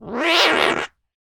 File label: Creature Voice Dry, Bodyboard; Hidden Voices